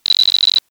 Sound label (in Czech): zvonek; sdr replay